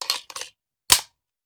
Machine Gun Foley; Sound Ideas; Guns

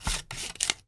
Cutting Cardboard with Scissors; Office Supplies; 1001 Sound Effects

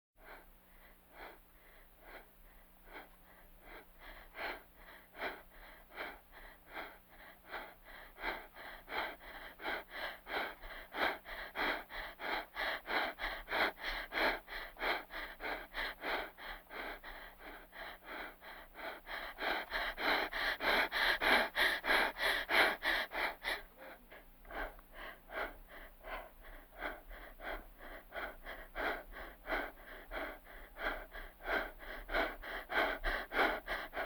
Vocal effects breathing Moaning Baby

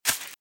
Velociraptor - Footstep - Grass - Run - Left; RadwinIsland

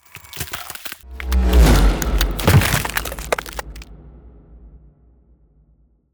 Ice Spell Transformation Shape Shifting; Arctic Aura

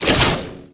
Gun Ammo Pickup, Weapons Foley; SFX Kit

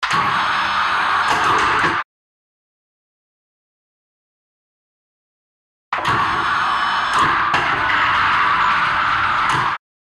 airlock gate open cargo bay; Code7

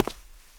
concrete; firefight

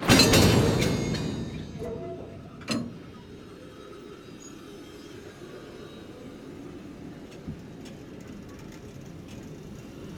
shunting track, train; best service Blue Box

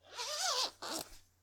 Zipper; Blind Survival

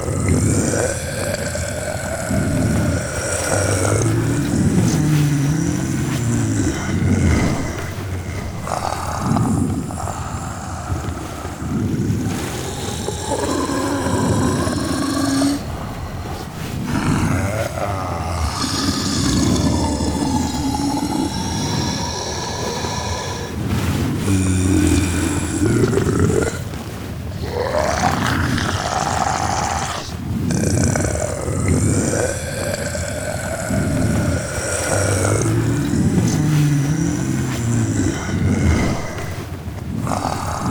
zombie movement; DeadFright

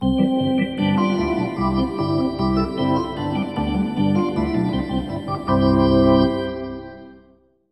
Hockey Organ

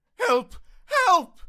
town human far; Blind Drive

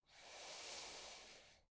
deep breaths male in; Apotheorasis

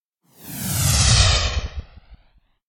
Whoosh Thin And Fast Stopping; Hollywood Edge; sound designer's toolkit v2

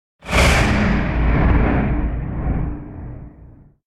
Whoosh Sharp Flying Off; Hollywood Edge; sound designer's toolkit v2

